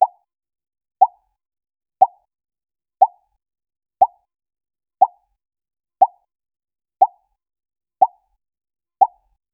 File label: soft short pop warm; abstract